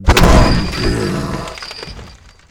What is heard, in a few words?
Frenzy Fist